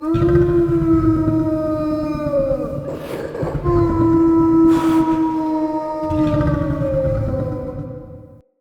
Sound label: Wolf Sad Howl; Animals; Perception